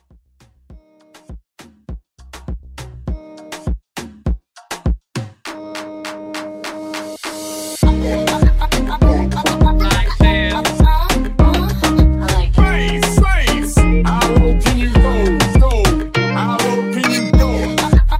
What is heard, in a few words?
Moombah Hype